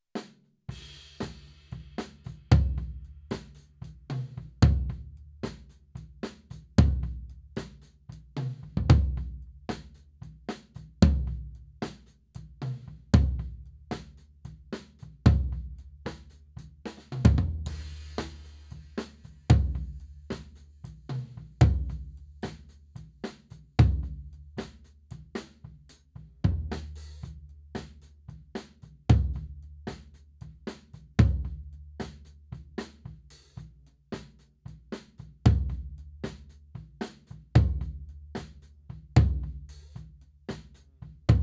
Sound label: Floor